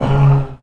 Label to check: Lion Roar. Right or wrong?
Right